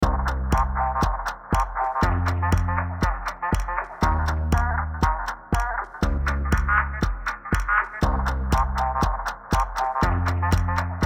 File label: LOOP SWING light drum clock